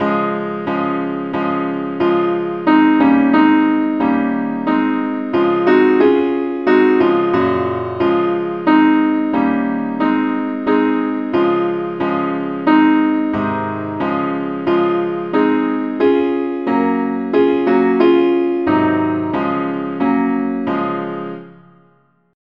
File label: simple acc key des